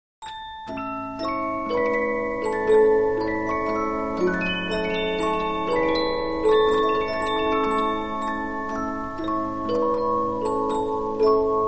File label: Polyphon, 15inch disc musicbox